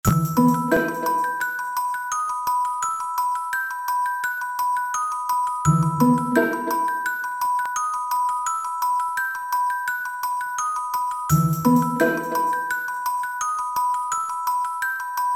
Dungeon Area - Cave Exploration; Mystic Chronicles; psp

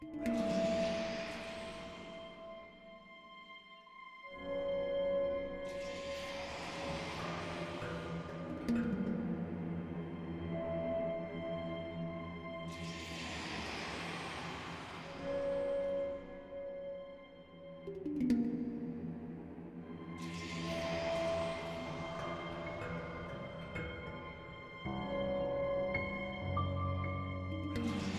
Cinematic Music - Tension

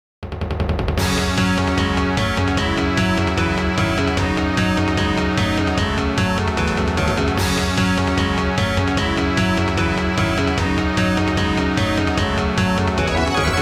Battle; rune factory 4 special

